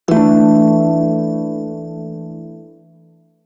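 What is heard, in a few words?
Prime Shawzin Pentatonic Major Chord C; Music; Warframe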